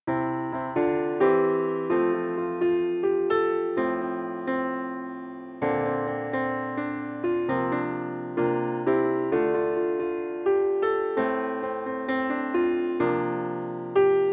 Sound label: Accomp; The Little Mermaid - A Musical Adventure; Ariel